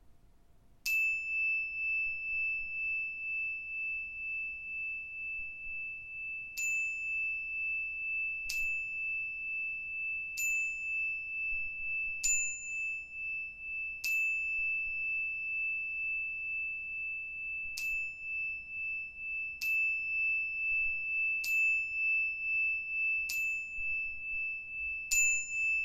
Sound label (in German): Klangstab